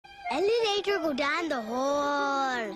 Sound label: plucky elevator